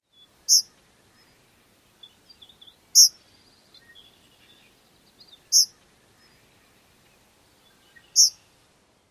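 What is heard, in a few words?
Eastern Kingbird kzeet call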